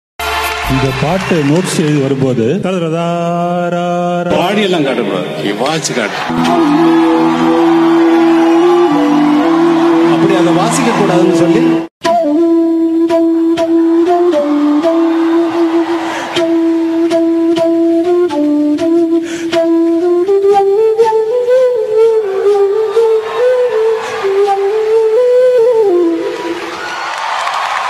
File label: flute bgm; sathya